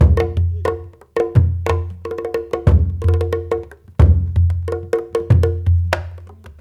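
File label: 90 bpm, Nyabinghi; Spectrasonics; Supreme Beats; African Contemporary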